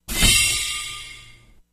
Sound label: Mechanical Door, pneumatic